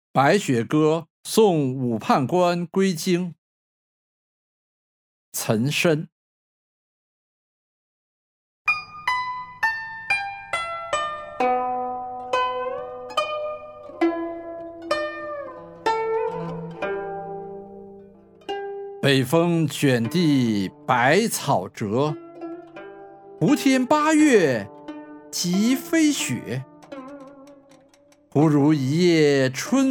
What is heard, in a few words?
music; bai xue ge song wu pan guan gui jing